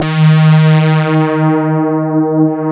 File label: CMI PIANO